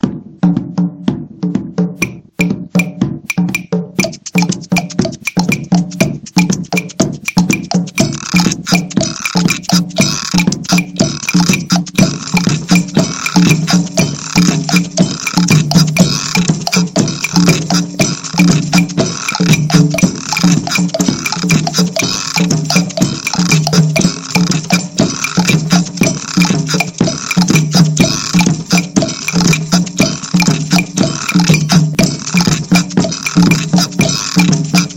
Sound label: Batucada